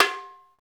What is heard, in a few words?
Tambarime; Club-50 - Foundations Roland; Exotic Drms